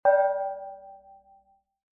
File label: tone